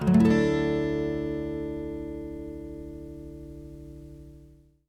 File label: Acoustic Guitar Chord, A Maj; Instruments; Guitars; Cymatics; Light Free Sample Pack; Bonus Samples